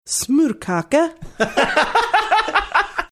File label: saying smørkage laughing